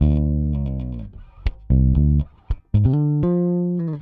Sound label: Pop, Bass